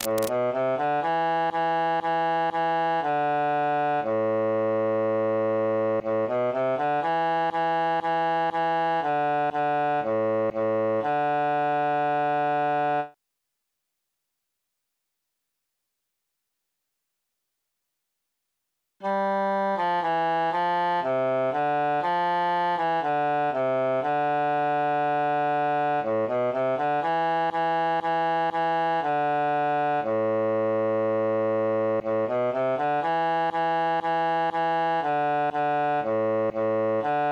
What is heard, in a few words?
bassus solo; il est bel et bon